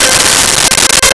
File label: Dulcimer C5; Nintendo; July 2020 Gigaleak